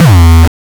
909 Dist Reso Crushed - E2, Kicks; Drums; Mbase 11 Kicks

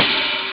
hh open; Amiga Soundtracker Sample Packs

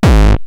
Roland TR-909 BD; BD Selection

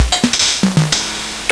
Nintendo Gameboy LSDJ KR-55

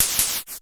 NOIZ, Dual Percussion Synthesiser; Klone

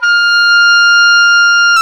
oboe; Roland LCDP04 Orchestral Winds; Oboe Brth 1

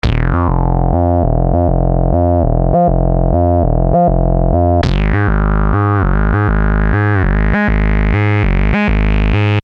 Syn Bass 100 F, Techno Synth Loops; SynBs; 100bpm; Sonic Foundry (Sony Creative Software); Signals I

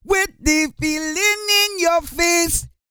REGGAE, Vocal Planet World Voices

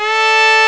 pling; Amiga Soundtracker Sample Packs

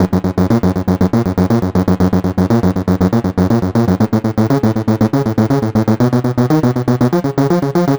Techno Synth

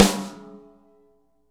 SN ambient; Real Mega Drums

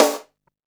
Snare, Drum & Bass; AMG - Prototype Drum & Bass; Snares

